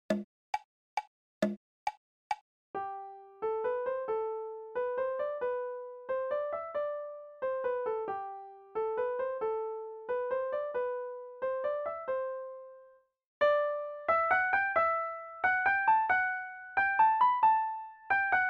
Gurlitt Music Box right

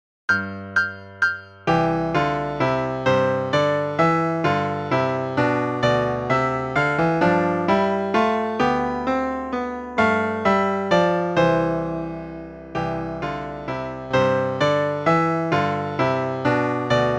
Duet

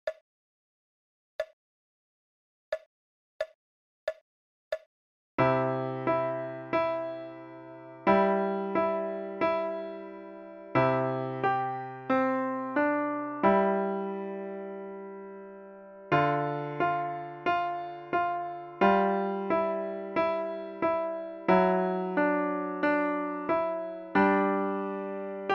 Jingle Bells Slo

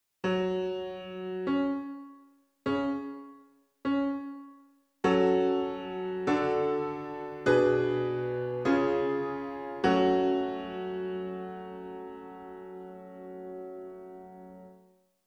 Piano

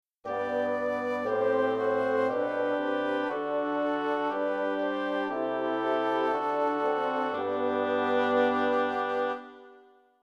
Chorale

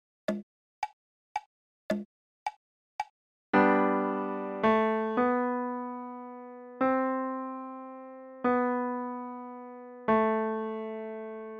Bach Minuet left

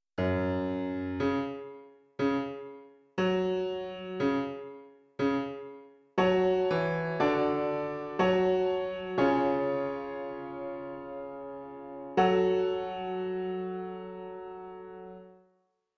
Piano

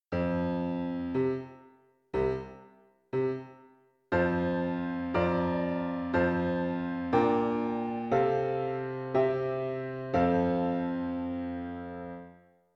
Piano

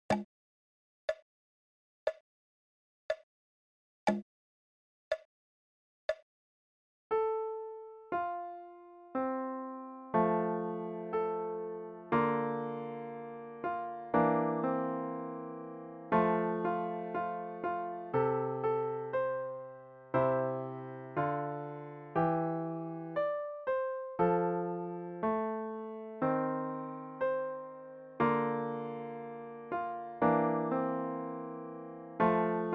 Swing Low Slo

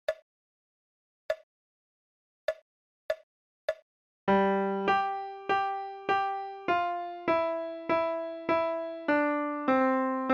For Health

